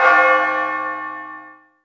town bell; ghost

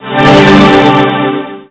menu prev; io tracks circuit; greenhaze

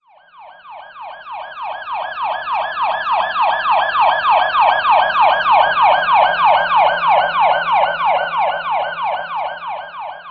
eagle

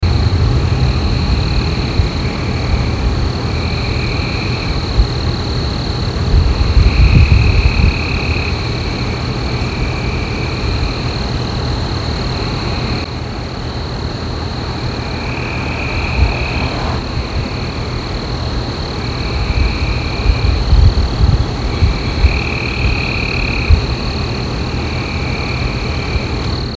mine drip; pmt treehouse

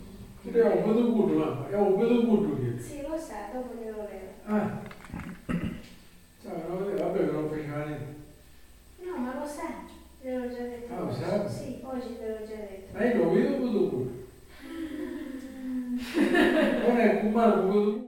kids

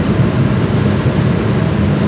escalate

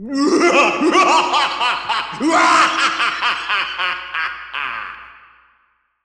lawnmower

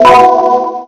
menu left right; io tracks circuit; darkvalemini

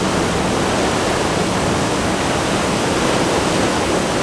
wash, ship

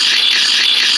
scrape

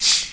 sprink

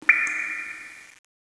dog bark

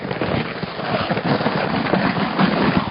animal; winterair1

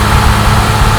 car

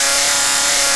lawnmower; winterair1

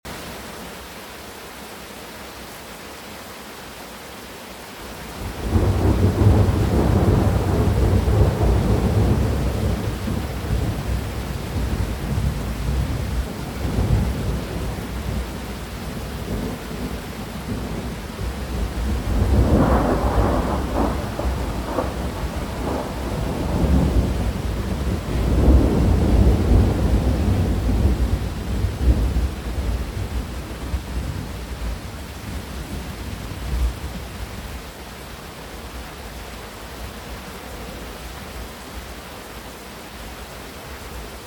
birds; mansion

